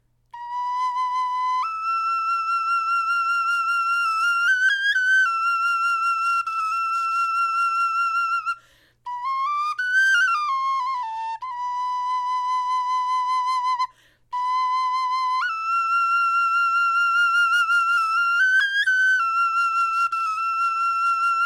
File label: melodie, knochenfloeten